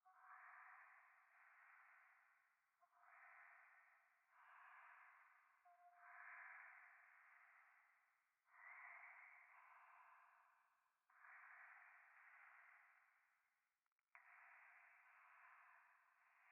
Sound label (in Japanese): ゴースト 右耳密着吐息